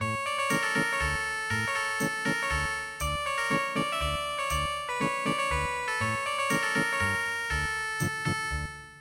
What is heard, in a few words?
Ethnic Guitar; midi traditional